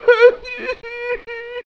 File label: male cry; Voices Emotes